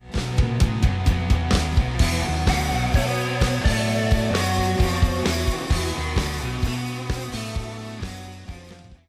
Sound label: Preview Stinger; apex legends season 12; Fuse